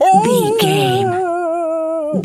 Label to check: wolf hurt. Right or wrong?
Right